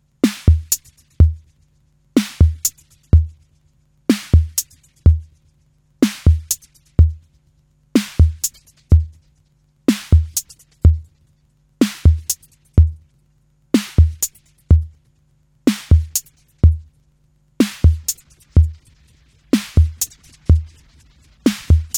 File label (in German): Boss DE-200 Digital Delay 160ms TR-606 moduliert